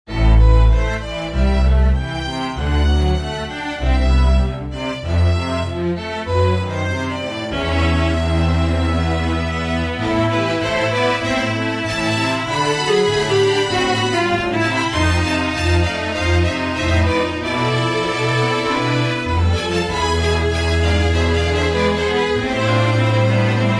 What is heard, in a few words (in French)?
culture traditionnelle, 弦楽合奏